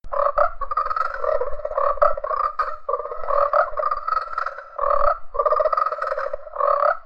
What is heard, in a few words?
Frogger idle; Barotraumatic; Psilotoadadult